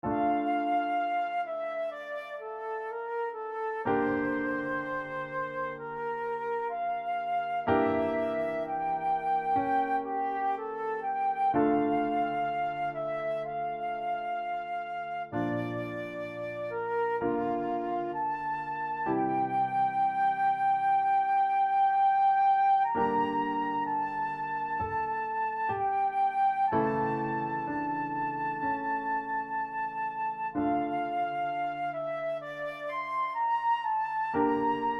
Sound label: Sad Ballad Melody